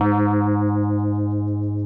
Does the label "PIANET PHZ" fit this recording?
Yes